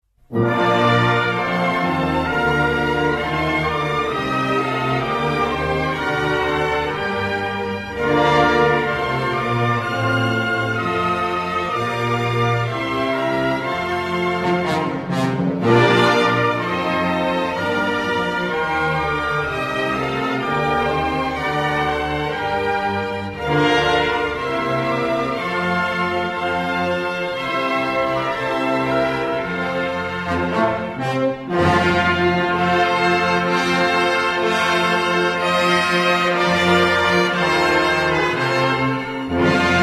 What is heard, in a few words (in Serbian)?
himna srbije instrumental